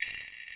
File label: Rattles, Roland JD-990; Drum Samples